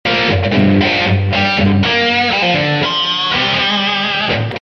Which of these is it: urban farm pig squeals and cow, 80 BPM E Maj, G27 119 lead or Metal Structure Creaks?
G27 119 lead